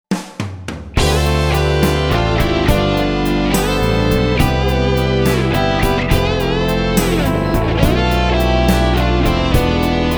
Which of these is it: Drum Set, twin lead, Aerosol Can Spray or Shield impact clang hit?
twin lead